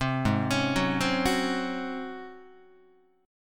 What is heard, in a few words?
G sharp 11th G sharp, down, Guitar; arpeggiated; JGuitar